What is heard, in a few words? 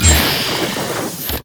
hamstring; LoL; darius zaunknight